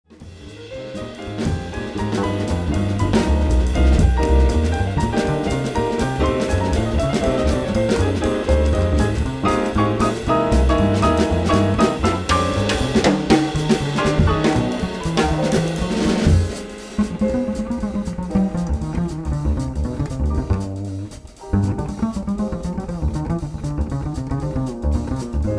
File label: jazz